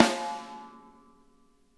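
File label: snare edge, drum kits; Sonic Reality; Interactive Drum Kits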